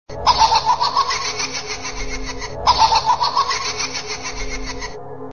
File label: puppet laugh; saw